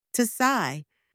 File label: to sigh; anglais; emotions feelings vocabulary